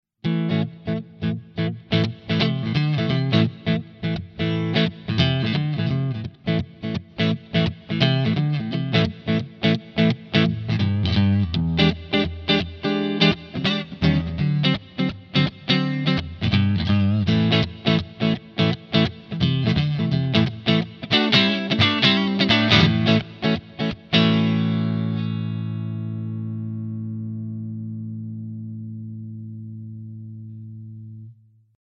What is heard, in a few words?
Schecter Neck SC clean; Nick Johnston